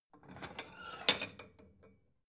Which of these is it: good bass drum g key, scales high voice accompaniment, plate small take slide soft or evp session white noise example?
plate small take slide soft